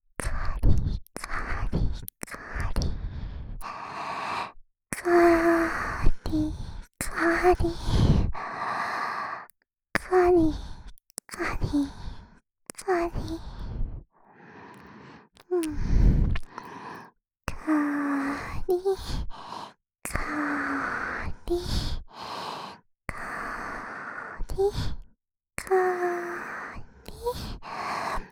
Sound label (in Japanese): カリ…カリ…, ASMR, 右耳